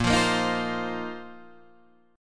A sharp Suspended 2nd Suspended 4th down Guitar Open D